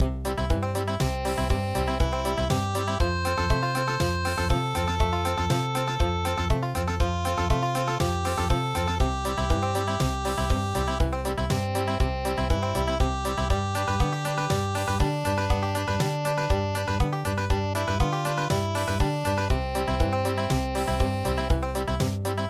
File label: on top of old smokey country